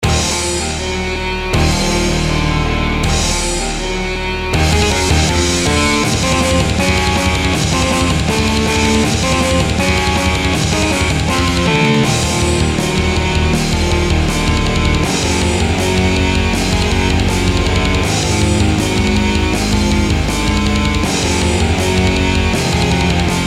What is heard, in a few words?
Metal